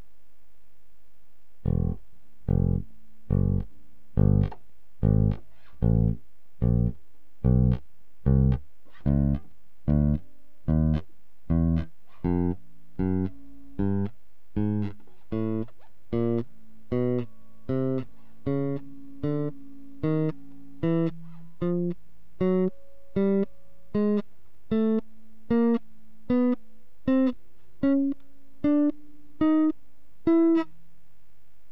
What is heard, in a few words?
FINGER BASSGUIT